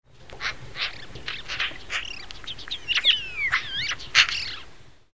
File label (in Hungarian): szarka